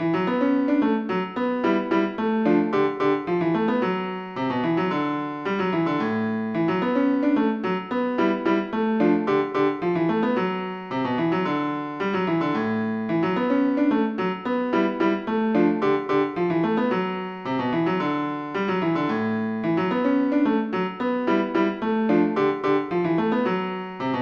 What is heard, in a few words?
jazzy